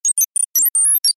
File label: science fiction computer beep tone response